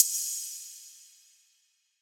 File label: Reverb Hat; Drums; Cymatics; 9God FX 2